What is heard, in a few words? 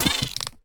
Spider Die